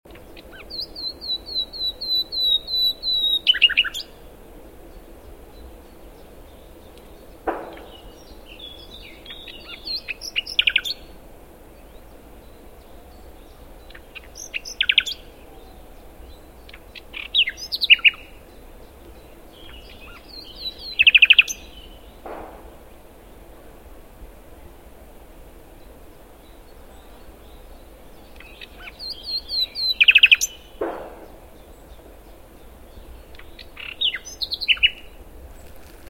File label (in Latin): Luscinia megarhynchos; SETE